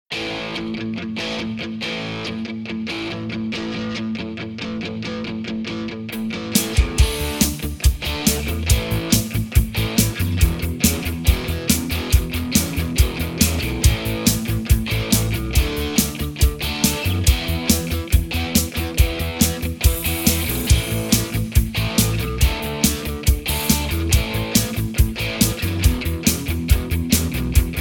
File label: A No Backing Vocals